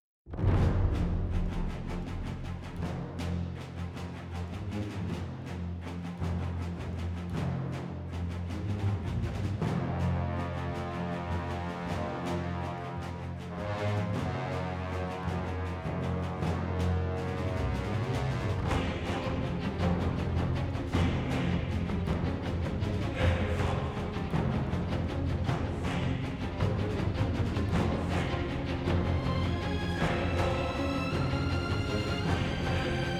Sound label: music blindpick; ruby